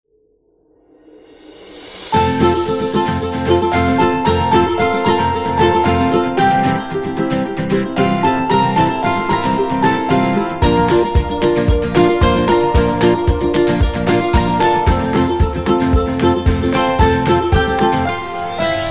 Summer Fun, happy music

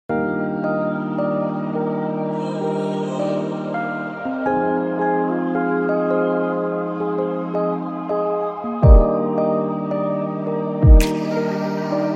Lofi Chill

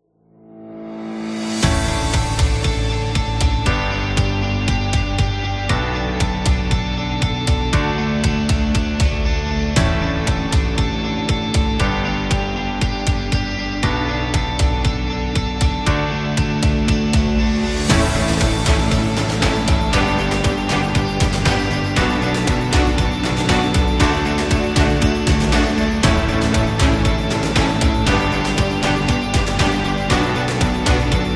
Motivational; self motivation

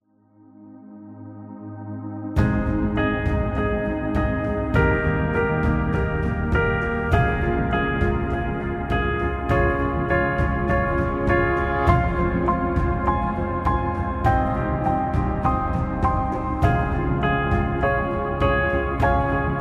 The Love Acoustic, happy music; happy music vol 8

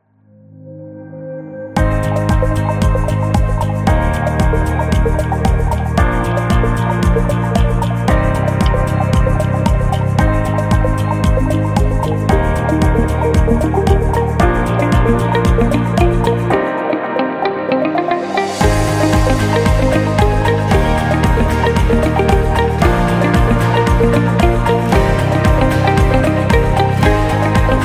Motivational Corporate; corporate music vol 21

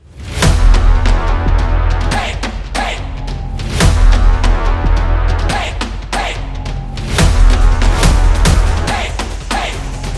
The Soccer Stadium, football drums

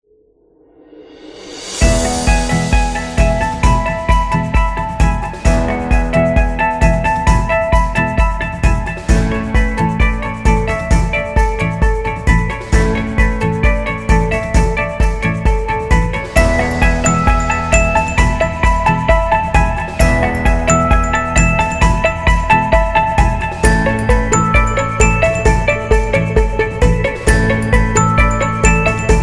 Celebration; sounds of the world

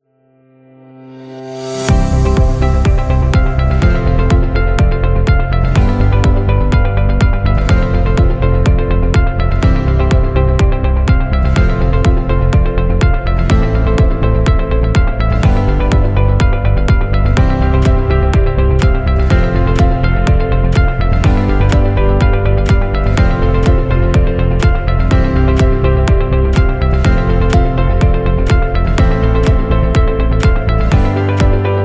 Presentation Music

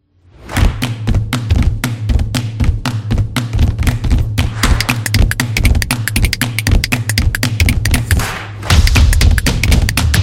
This Is Claps Beat Percussion; percussive nation vol 6

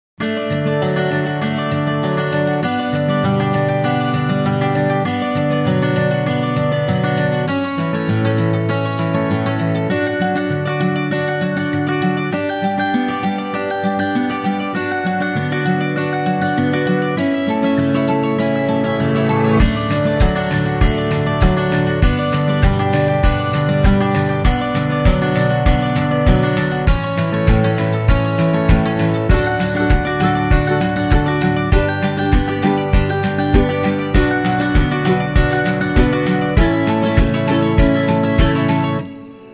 Acoustic Guitar Background; happy music vol 7

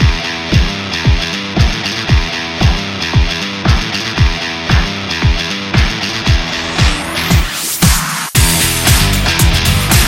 Energy Pop, corporate music; corporate music vol 18

